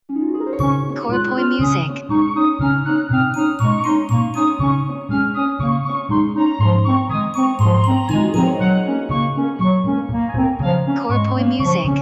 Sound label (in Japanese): jingle ウォーター